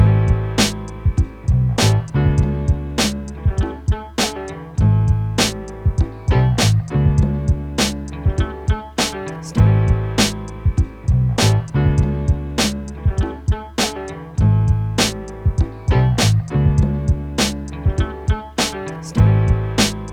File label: Summer Beat